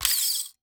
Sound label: Foley Toy Magnum 45 Gun Firing